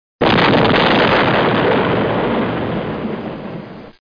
big bang

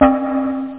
cowbell; percussion

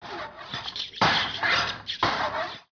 hunting, Ambient; ZEUS

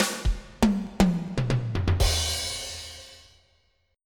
fill

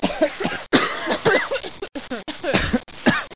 cough; 2000 Sound Effects

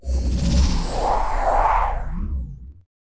spell; poolofradiance

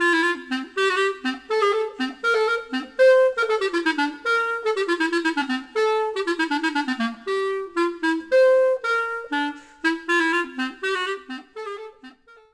CLARINET

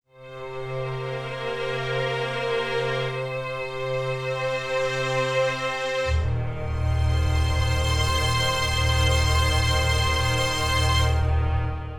STRING D+B 160 C; eJay; Drum+Bass

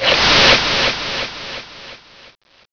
scratcher

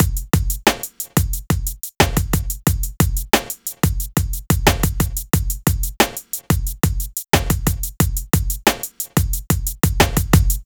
Drums Loop, Latin; maudio; Latin Element

